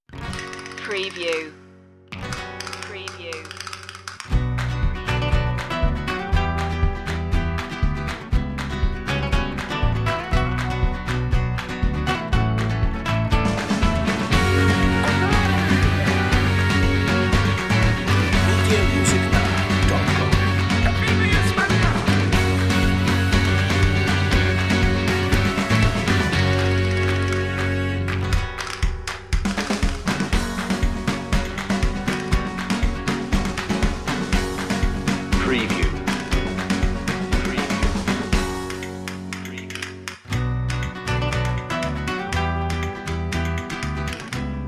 a spanish fly

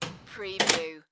Hospital Door Close; Sound Effects Bible

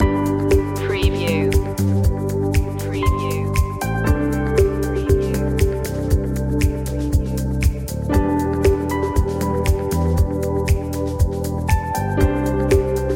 corporate

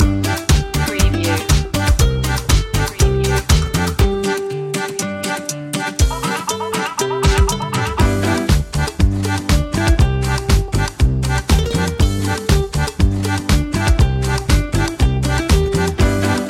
fun in the sun no vocals loop

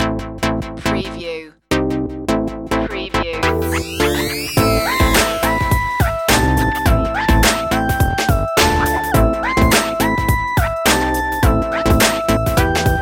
hip hop

